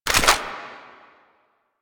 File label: Computer Board Pickup; Warframe; Quests; Entrati Labs